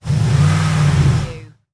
Truck 4.7 Liter Rev Engine Perspective

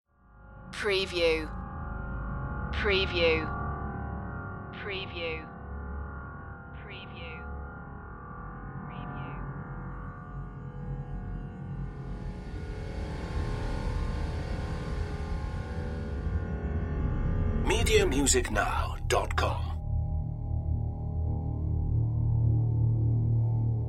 dark ambience

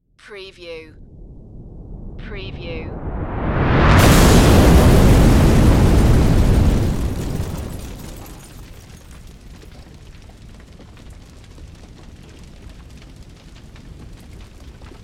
EXPLOSION FIREBALL FLAMES FIRE

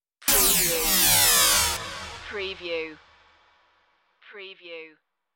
Dark Synth

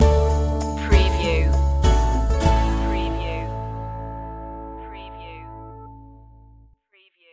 once in a lifetime stinger

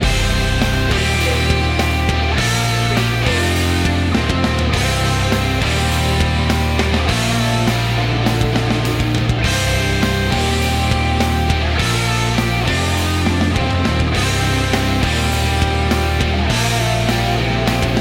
dropped riff

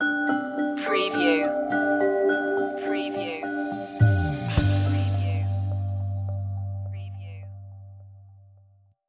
safari lite stinger

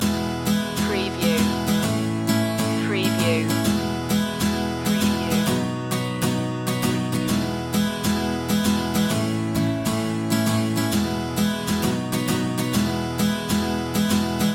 sixties flower power loop

